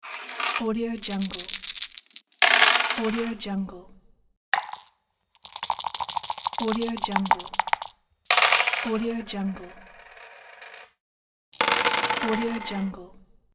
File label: dice